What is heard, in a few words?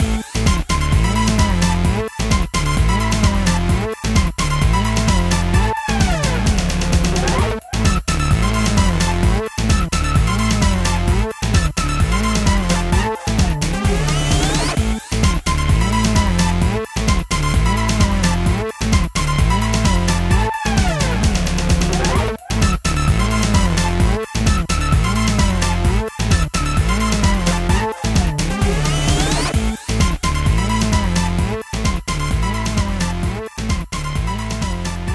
K-Pop Kitchen (Speed Up); Cooking Dash